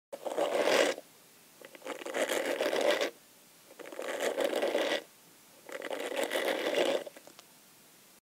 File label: combing hair; شانه زدن مو